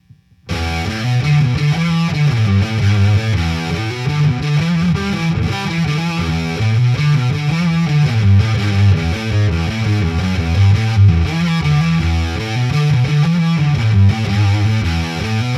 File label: Peachfuzz High Gain; pedal; FRANTONE